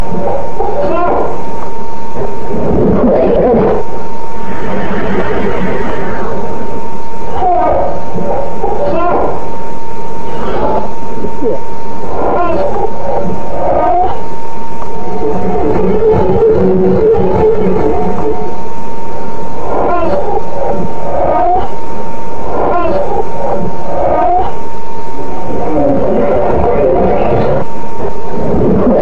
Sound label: White Noice